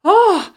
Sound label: voice female hit